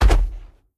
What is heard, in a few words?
foot land; smite; zhongkui